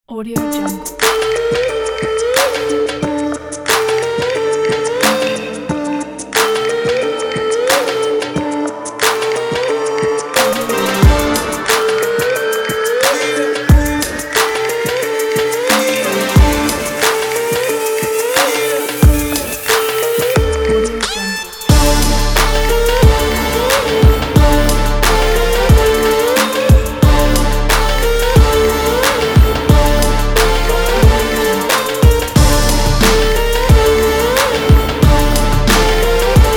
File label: arabic trap